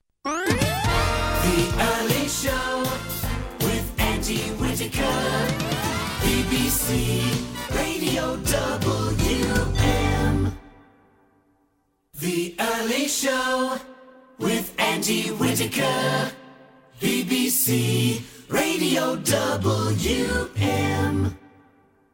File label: radio jingle; Radio WM